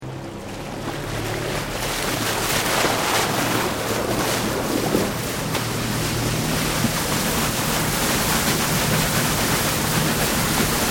BOAT WATER TAXI HIGHSPEED MANEAUVERING, Small water taxi; Boats (Sound Effects:Vehicles)